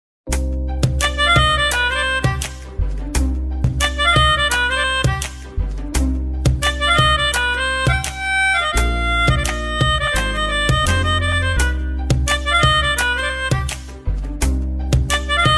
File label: interlude bgm